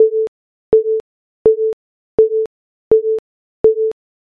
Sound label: railroad